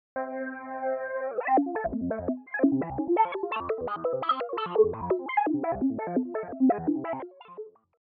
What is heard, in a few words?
Emu Modwheel